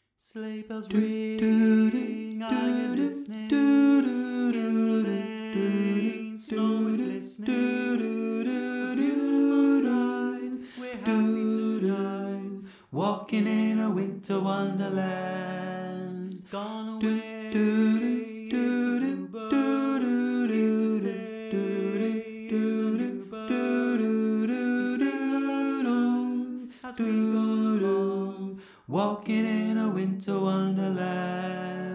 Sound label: Tenor, gospel choir